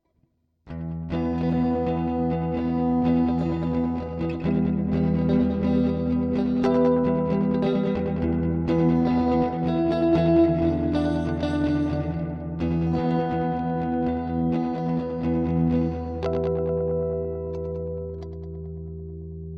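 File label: Guitar Vintage; ReTracer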